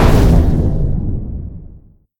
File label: explosion big; Mindustry